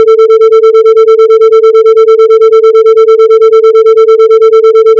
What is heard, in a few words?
Autogenerated sine 120 cents